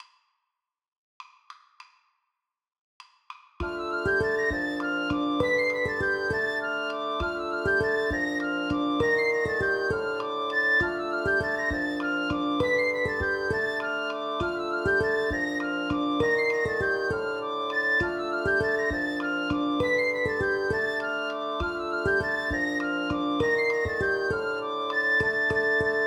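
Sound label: Ceremonial dance; MTWFC VOL1 Audio Accompaniments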